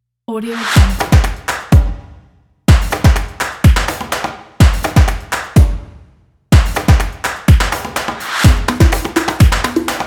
drums

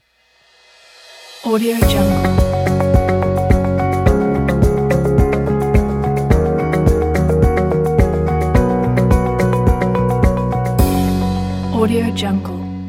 corporate ident pack

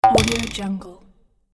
cartoon boing vibrato stop; cinematic-designs

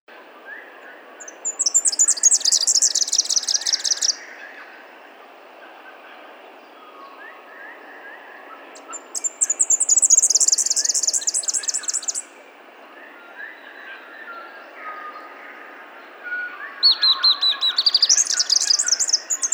birds ambient

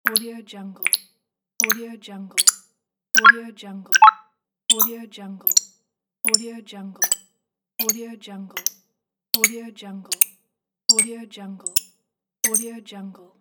interface clicks

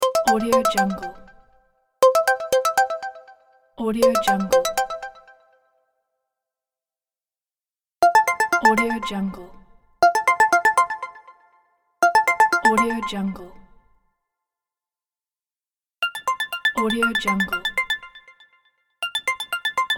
ringtones; jtc-music

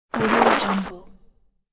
raspy ice or dirt slide

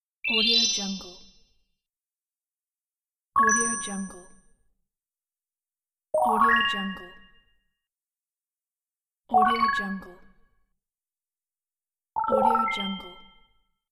sparkling collect; efxideas

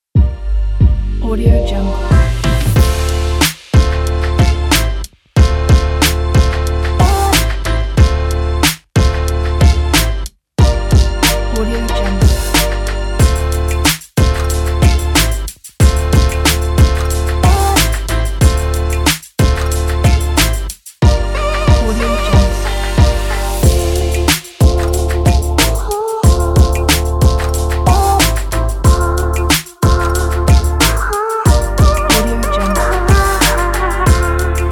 hiphop

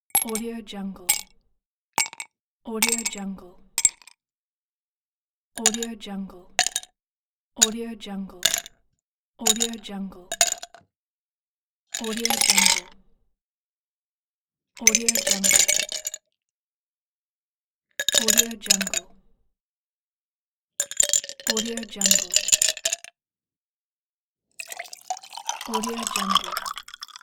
ice cubes; sounddivision

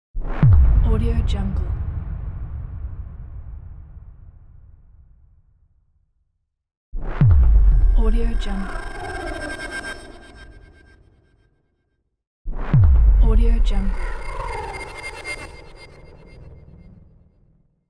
cinematic boom

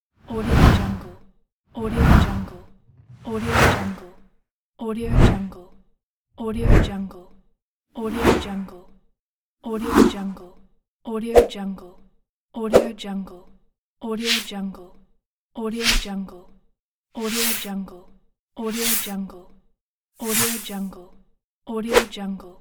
swooshes

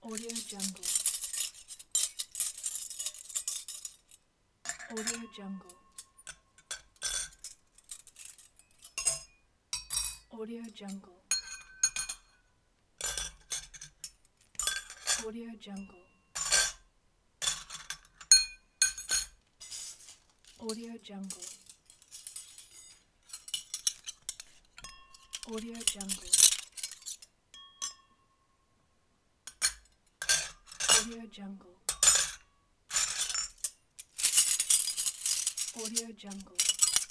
forks spoons

